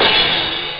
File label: hihat close hard; Amiga Soundtracker Sample Packs